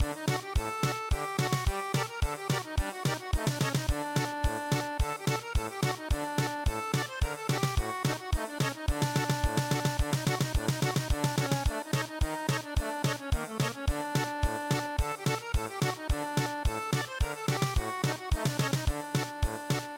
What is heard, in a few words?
German Polka